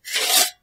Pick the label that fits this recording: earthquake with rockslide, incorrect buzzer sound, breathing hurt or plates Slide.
plates Slide